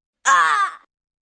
Impact Oahh, Grunt, Impact, 1 child, raspy; Pain

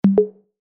Chime Musical, Chime Musical Multimedia; Web Interactive; Chimes (Sound Effects:Multimedia); Blastwave; Technology

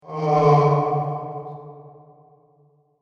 A Ghastly Moan; Ghost Sound Effects; horror; scary videogames, and sinister halloween radio, tv, programmes, and creepy film

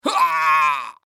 Human Male Groan Pain; Groaning; Voice

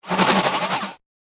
Whoosh; That Whoosh Vibe

